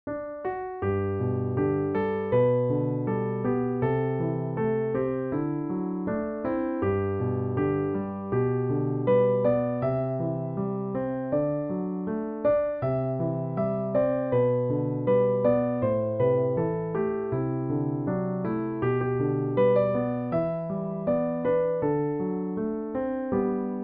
loch lomond piano

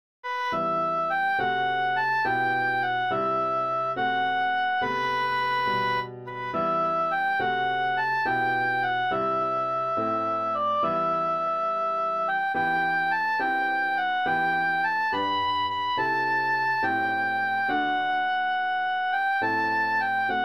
oboe and piano; gabriels message

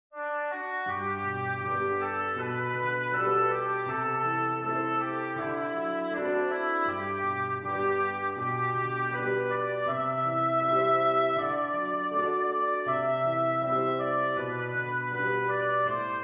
Loch Lomond oboe piano

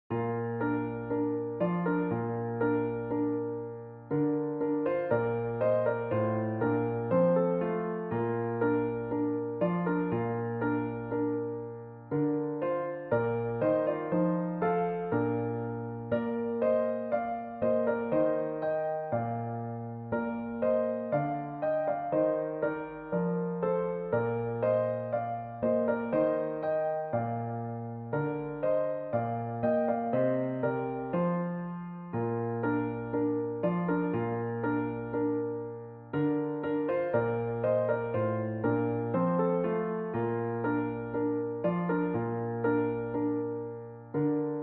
il est ne le divin enfant keyboard; christmas music and carols

